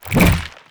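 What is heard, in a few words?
gavel, attack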